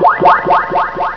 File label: New Caller Alert; Hermes; New User Manager 2.5